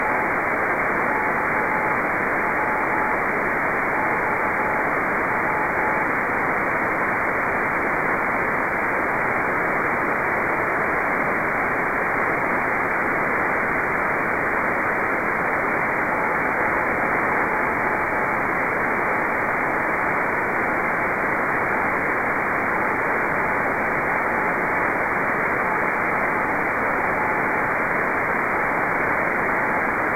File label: HO-68 CW beacon doppler correction; dopplerkorrigering av sdr fran wxtrack